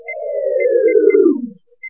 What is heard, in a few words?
Shut Down; Interface